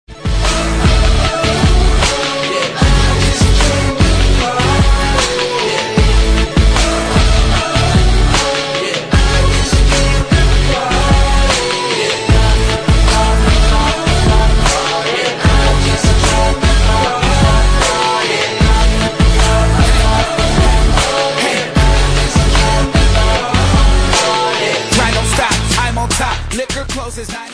handclaps and guitars

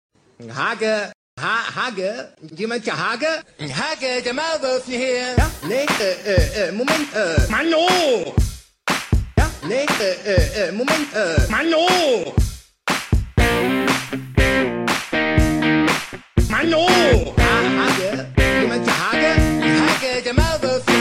Mix Rock

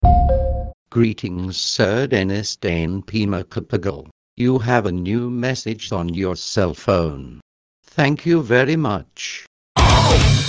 Is Calling Rap, ringtones

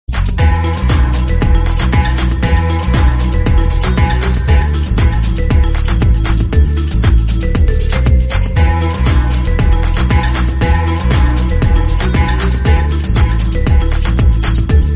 beeping dance beats, electronic ringtones

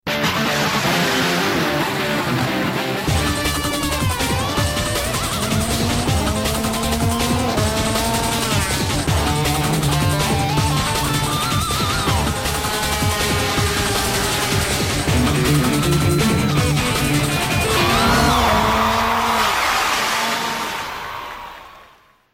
theme song; motogp

